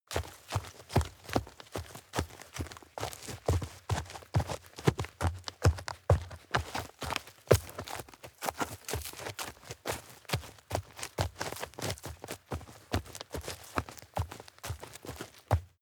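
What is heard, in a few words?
step dirt heavy boots walk fast, footsteps; schritte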